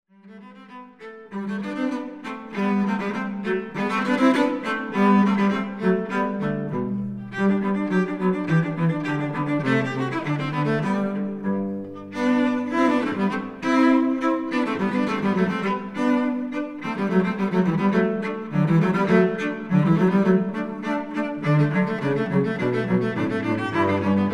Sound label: Cello Duo; arrival of the queen of sheeba